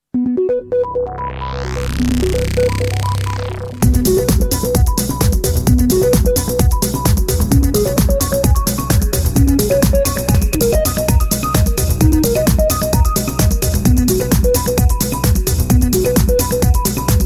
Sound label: progressive ringtone